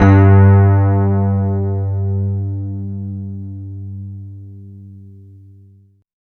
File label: RHOD G2; East West - Phat & Phunky